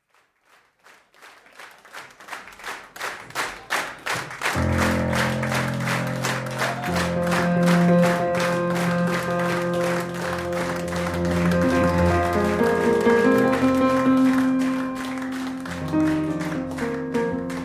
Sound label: Birdie Blues Live